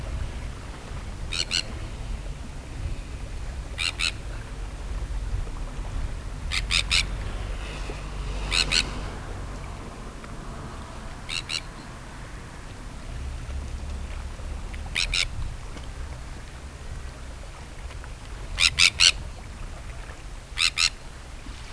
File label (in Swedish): Kentsk tarna